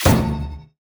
shield bash; CrossCode; battle

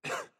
cough; CrossCode

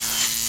spark; The Swapper

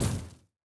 fire hit light; CrossCode; battle

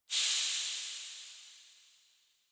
robot steam release; boss

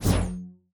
close combat wave sweep; CrossCode; battle

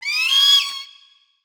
pillar hawk; battle

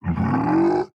hedgehag jump; boss

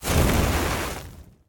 ignite Burn; World Of Goo; Fuse

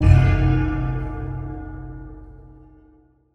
dramatic gong

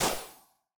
ball hit cold light; CrossCode; battle

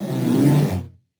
panda grunt; battle

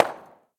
bump; BlockHead; World Of Goo

